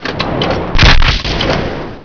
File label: attack